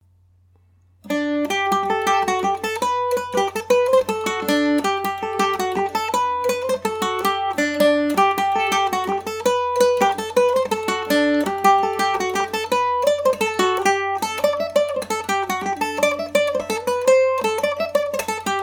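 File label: G major md304 md304, Irish mandolin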